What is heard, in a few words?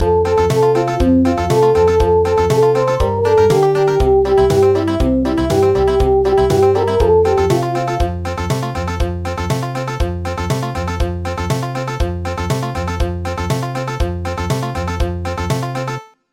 Country